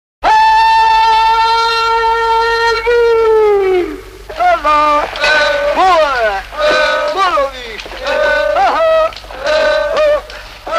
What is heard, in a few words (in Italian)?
Canto ritmico dei cavatori di marmo; Canti su lavoro e economia